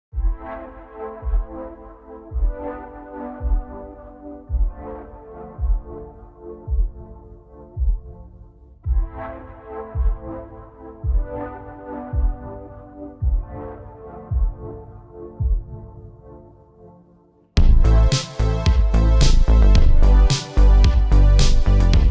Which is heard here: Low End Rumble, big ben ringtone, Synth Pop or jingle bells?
Synth Pop